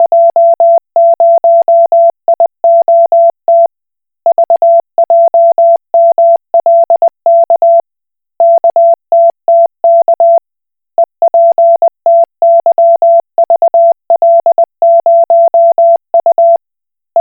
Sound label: Koch Morse